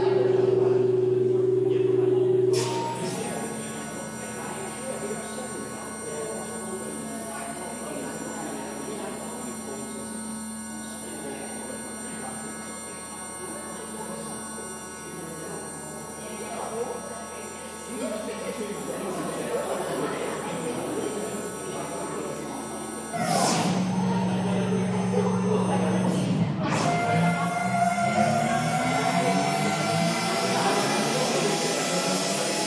sound and light installation winter gardens concourse sheffield